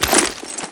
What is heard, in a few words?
ammo take; garrysmod